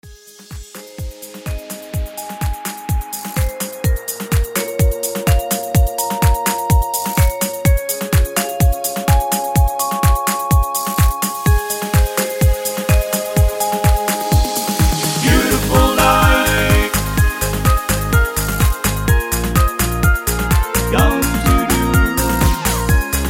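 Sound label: Instrumental with background vocals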